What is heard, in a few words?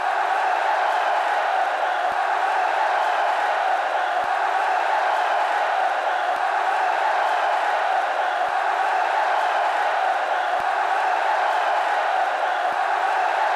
audience noise